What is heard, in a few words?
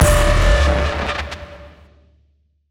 BRAMS, Cinematic Sound Effects